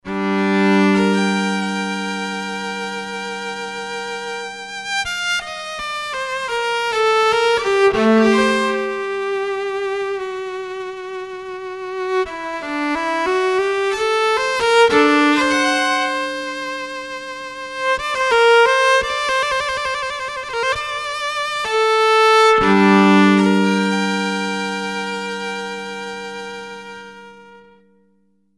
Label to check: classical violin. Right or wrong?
Right